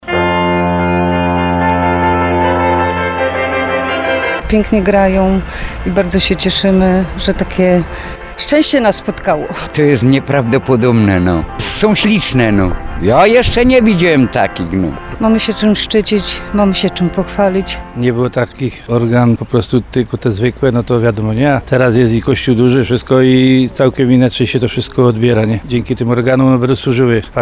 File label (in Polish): organy